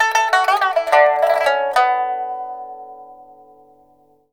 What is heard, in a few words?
PIPA PHRASES; Spectrasonics; Heart of Asia